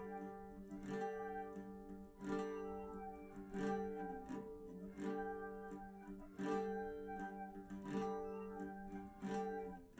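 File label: no drums, live recording 250ms 5cm; SiSEC11; underdetermined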